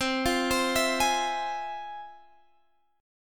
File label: F Minor Major 7th, chord